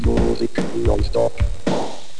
musique non-stop

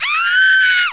ghost Die; Geschicklichkeit; Pac Manic Worlds 3D

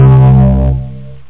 rezzzz bass

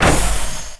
door base; environment; starship trooper

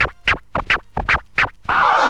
scratch

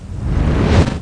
blast; GEOGRAFIA

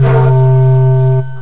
bottle blow

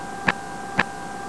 switch

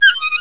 Squeak; Princess and the Pea; SoftKey

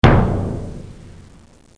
bass drum; Music Game (1994)(Microforum)

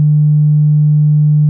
humm; geo-cub

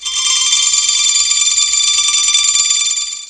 Sparkle; Museum of Anything Goes

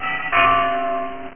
BELLS

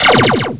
SHOT LASER; gubble

